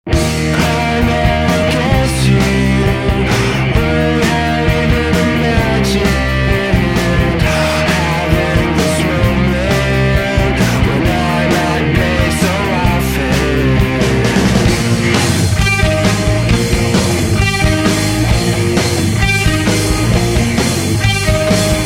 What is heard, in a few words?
Phaser Song